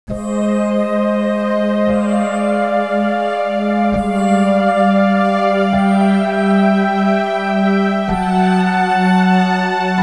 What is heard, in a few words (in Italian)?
audio suonerie musica mistica